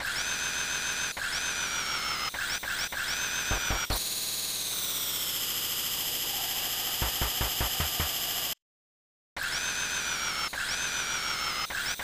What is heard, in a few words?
Mechanical Tinkering; Earthbound; snes